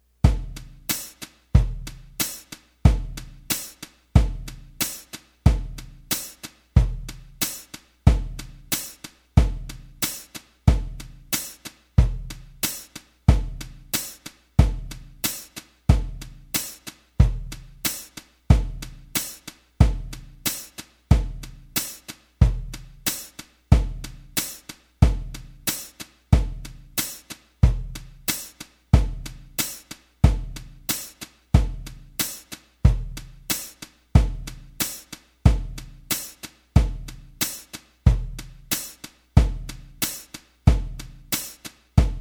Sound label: Country Boom Chicka Slow